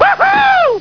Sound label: woohoooo